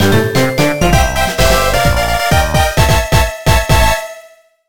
Fanfare; Slide Adventure Mag Kid; J Fanfare Type1

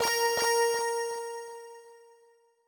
Chime; chime sharp

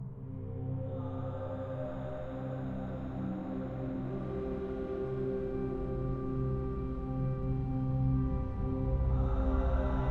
Cave Med Loop; assassin s creed chronicles india; First Civ Chamber

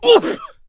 clown hit

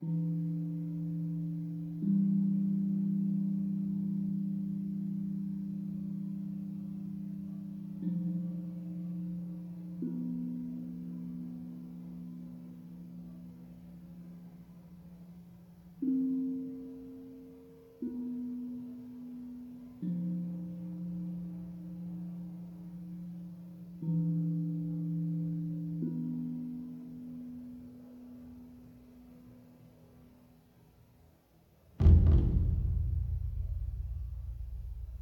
Eerie Dungeon (Descent Into Darkness Percussion); Age of Conan Rise of the Godslayer

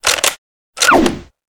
Boss Attack

